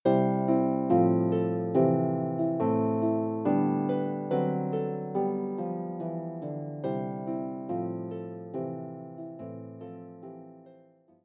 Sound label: Harp